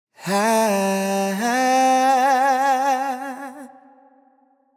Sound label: Euphoria Ad Lib Wet - 95 BPM A Min; Cymatics; Vocal Sample Pack; Ad Libs